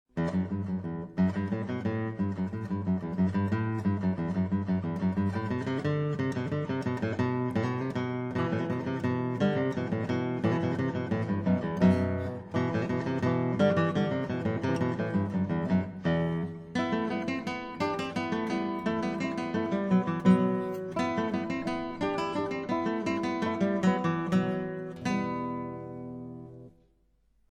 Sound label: Toccata